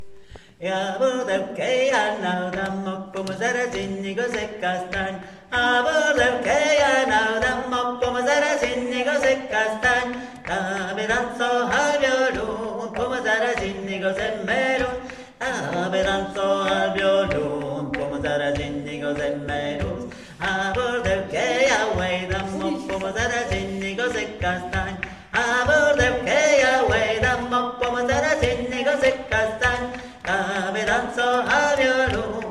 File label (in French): 2e voix; A Bordeu